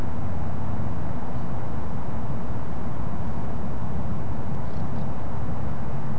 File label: UNID strange two note scratchy call, nocturnal flight call; nocturnal flight call project in beijing autumn 2021